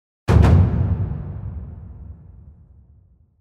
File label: short taiko